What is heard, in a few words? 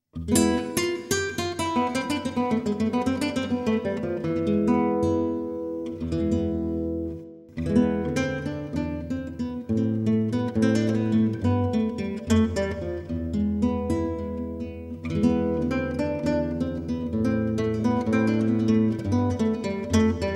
Spanish guitar